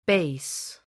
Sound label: Bass